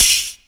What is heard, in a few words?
PERC FX, Big Beat; Big Beat Samples & More; AKAI